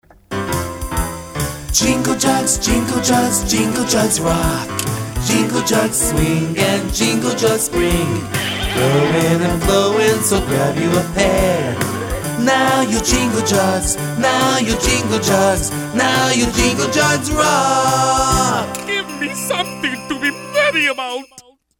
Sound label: jingle rock; MJ Morning Show